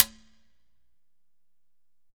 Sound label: Congas wet, drums percussion